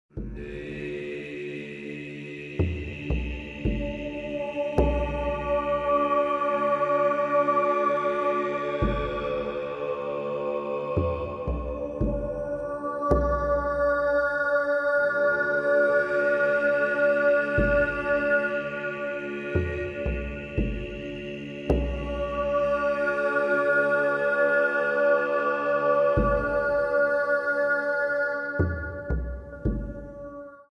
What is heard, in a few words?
Lament, Sounds of the Ancient World